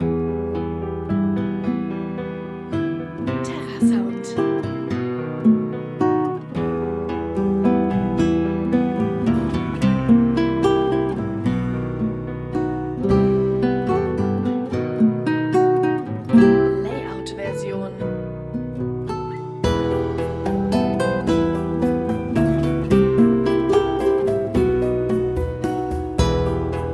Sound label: spanish melancholy; terrasound